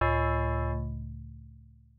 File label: Coin Player; arcade; gauntlet